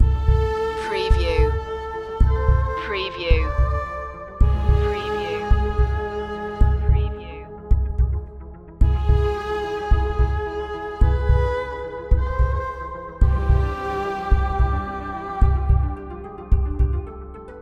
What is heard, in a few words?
heart beat nerve loop